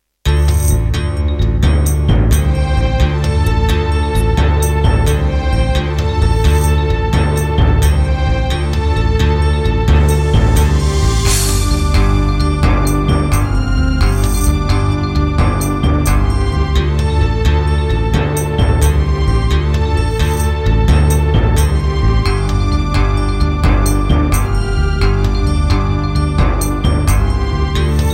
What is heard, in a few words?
Yucatan FX; Turtle Beach Cancun FX Clone; Heretic